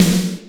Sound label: GATE SNARE